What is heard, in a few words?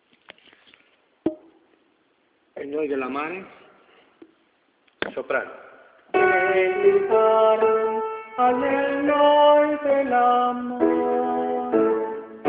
soprano